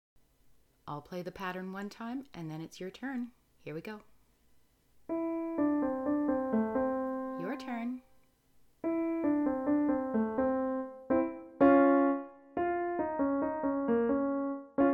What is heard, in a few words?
jazz